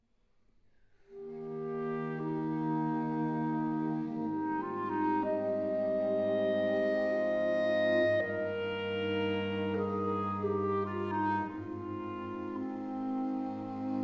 Bagatelles Romance Andante tranquillo; Five Bagatelles II.

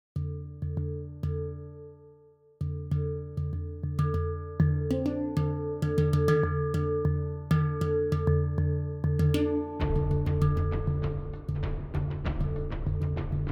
drone jungle bpm98; archibald editions

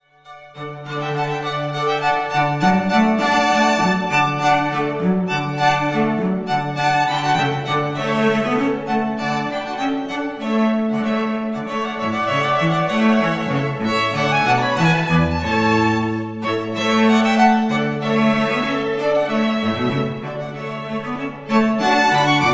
String Quartet; Handel; La Rejouissance; Wedding Music; Ann Arbor, MI; White Pines Entertainment

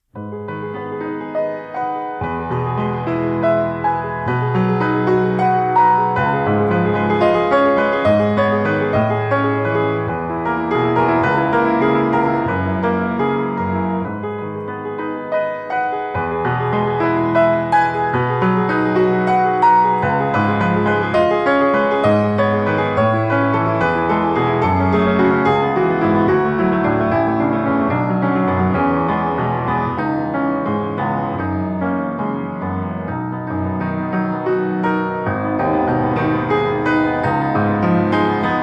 G# Minor

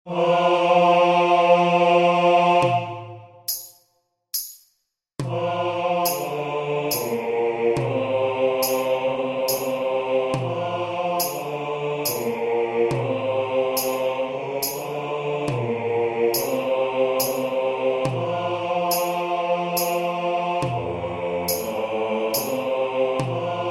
BASS My Country Tis of Thee

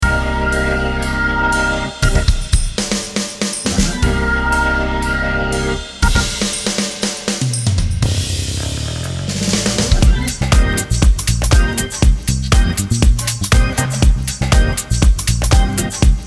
Funkay Bed

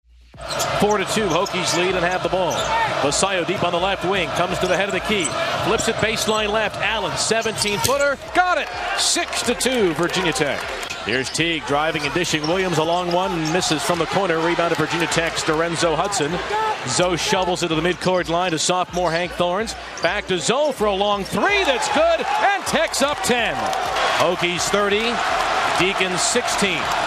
Beat; ACC Giant Killers

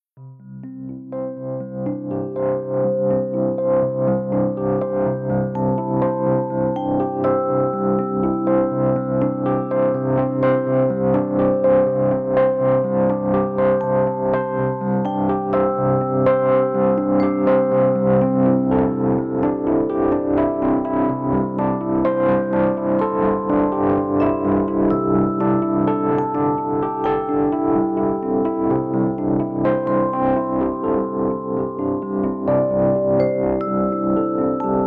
Rhodes

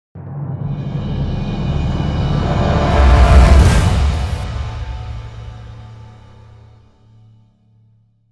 Signal Sting; The Signal Sting

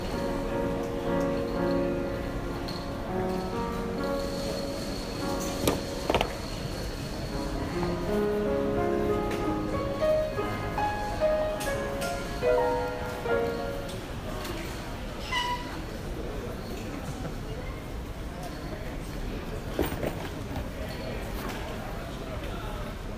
Palermo Airport Departure Lounge

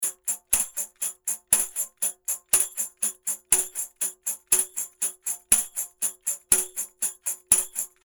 120 TAMBO BIG EIGHTHS

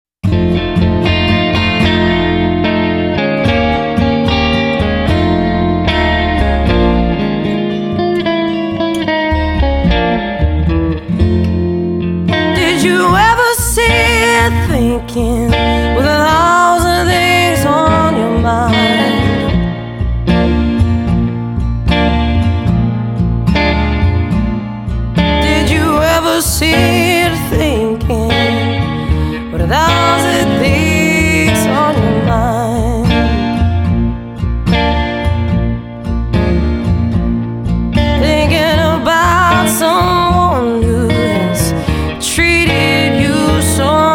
Thinking Blues

